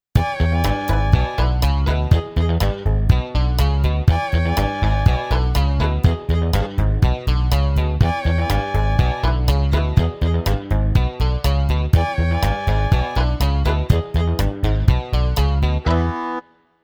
country; 16-bit, 44.1 kHz stereo; xp-80; Multi-patch demo; roland; roland demo cd-3

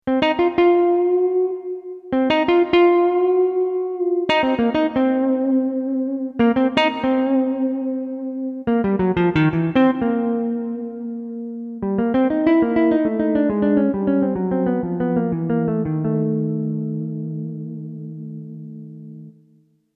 Blues Guitar; xp-80; roland; roland demo cd-3

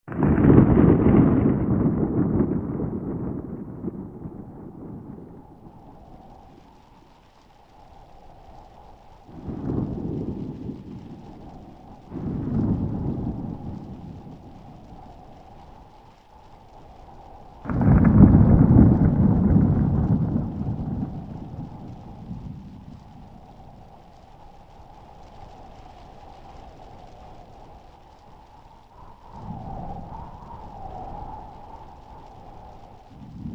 Rain with Wind; xp-80

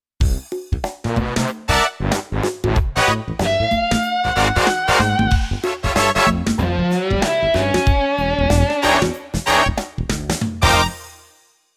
session; xp-80; roland; Multi-patch demo; roland demo cd-3

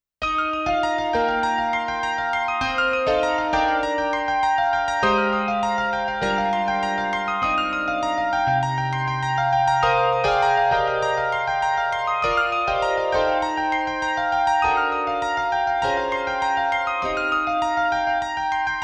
E. Grand; xp-80; roland; roland demo cd-3

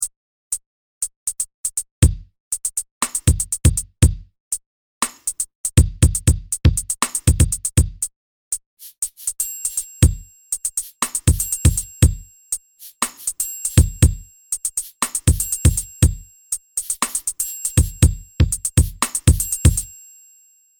R and B Kit; fantom-x8; patch demo

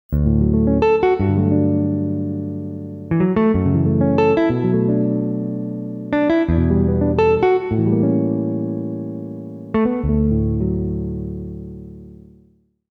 Jazz Guitar; xp-80; roland; roland demo cd-3

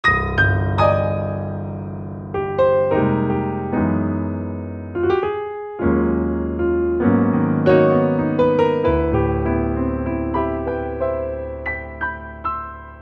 Premier Grand, xv-5080; SRX

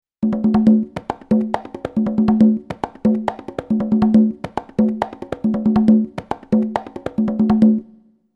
138 Conga Lp; xp-80; roland; 138:Conga Lp 4 patch demo; roland demo cd-3

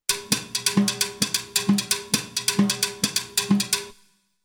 132 Tmbls Lp; xp-80; roland; 132:TmblsLp 3 patch demo; roland demo cd-3

